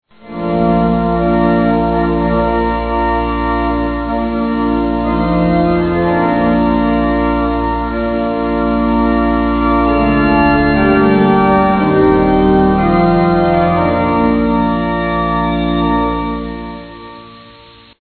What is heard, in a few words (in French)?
mélodie nuptiale